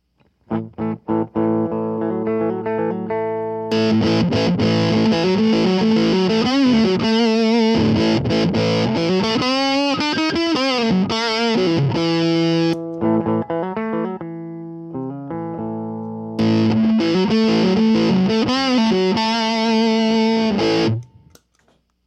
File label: Lower Gain Grind; Zero Demo